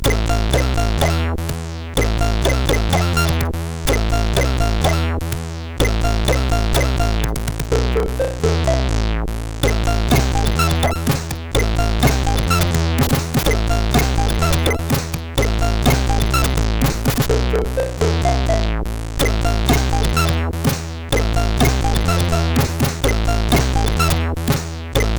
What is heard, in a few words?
Action Drums, CSG8580R5; sid